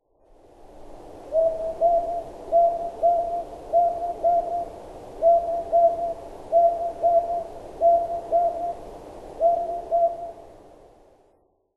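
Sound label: aobazuku, Northern Boobook; utsunomiya; koe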